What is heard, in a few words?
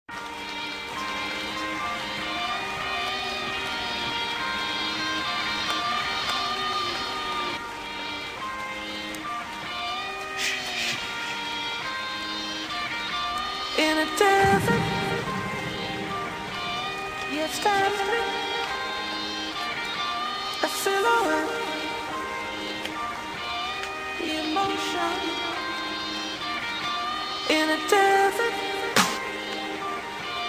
Dubstep Remix